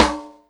Rim Rim Snare

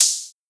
Hi Hat Close

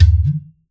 Perc Udu Low; PJCohen

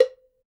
Perc; foxdot default

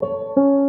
Piano 174bpm Bm, LIQUID; DNBACADEMY

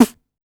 snare; foxdot default

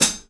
HiHat Foot DeathMetal

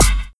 Kick Fx HipHop

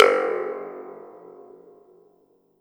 Perc Thundertube; pitchglitch

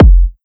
Kick

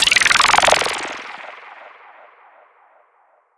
Alien Ship Hi Tech

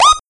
Woop Squeek

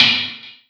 Perc Metal

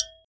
Perc Triangle; Bruno Bosselli

